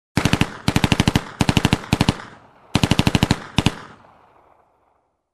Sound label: Machine gun shooting